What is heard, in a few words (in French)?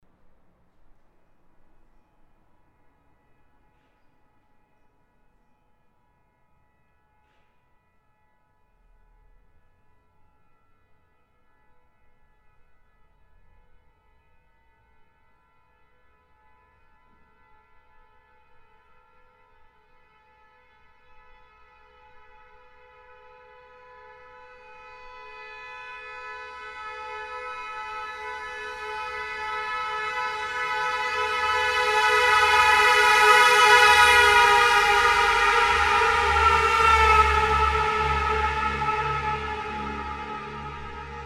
effet doppler pour deux klaxons bloqués; la Régie